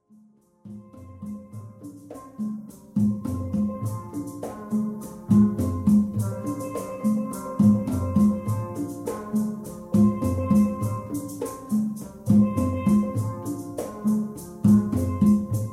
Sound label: Steeldrum